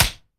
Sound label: point